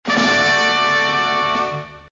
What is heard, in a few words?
tada